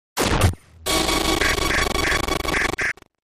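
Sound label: Static Electric Short, static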